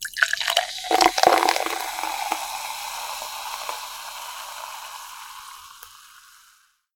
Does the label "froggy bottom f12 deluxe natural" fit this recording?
No